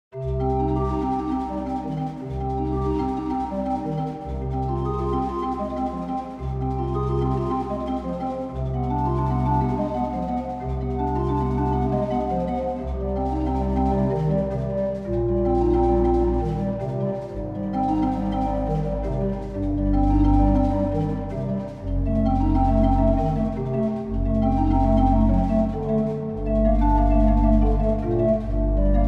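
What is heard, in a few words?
Dynamic OrganTeq Gedact BACH BWV 999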